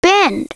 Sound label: bend